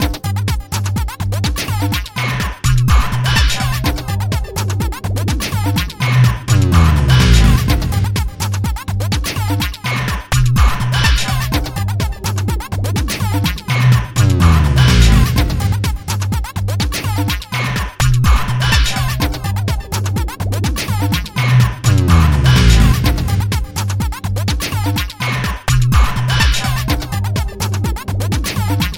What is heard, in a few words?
House Tone, ringtone